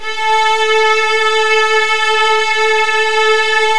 A2, Virtuoso Strings

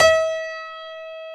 e5-a#5, piano, grand; Giga Samples Collection; ARS Grand

